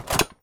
armor

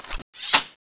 stab end, dual sword; tfa cso

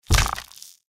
mirelurk foot; npc

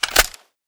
clip in; type19

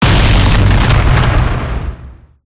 security bot explode; gow

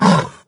pain, doom3 pinky

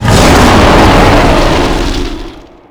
shout long

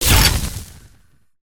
gunstand flamethrower fire stop; gow; turret judgement

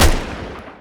leopard mg; weapons

